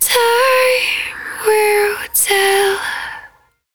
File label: 110bpm Ab, Velvet Vocal; Zero-G